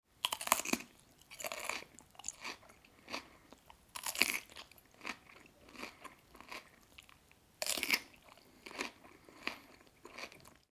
hrumkit nizhynskogo ogirka

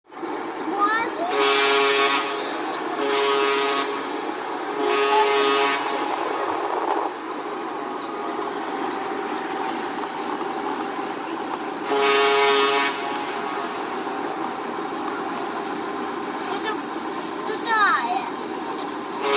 gudok teplohoda na ternopilskomu stavu

